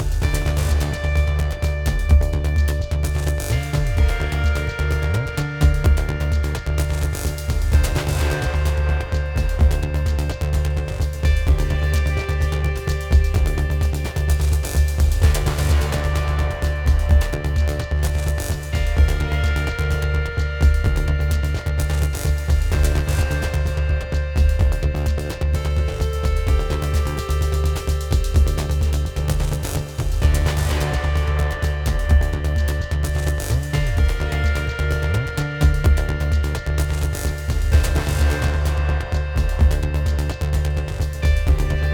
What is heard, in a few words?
Epic Hits; Battlefield 4; Bridge Battle